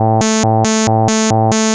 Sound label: Mini Osc; SFX & One-Shots